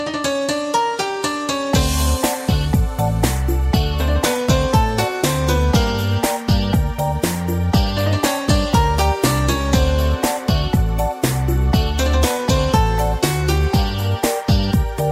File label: Tango